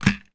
household trimmer facial hair place into charging dock; sound effects one